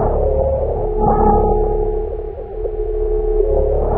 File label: alien cycle tone, ambience; Garry's Mod; hl2; HL1